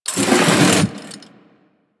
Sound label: Classroom Chair Drag; Ambience; Warframe; The New War; Quests; Zariman